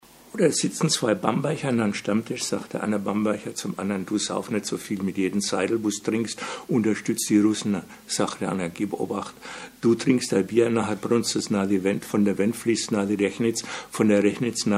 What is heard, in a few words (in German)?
fränkisch rumba; trachtentänzer